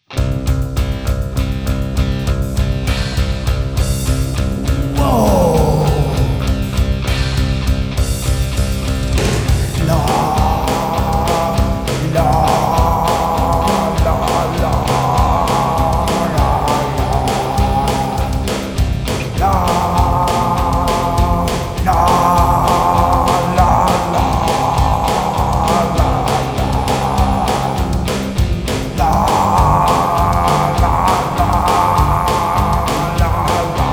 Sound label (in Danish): Filmmelodi Punk Hard Rock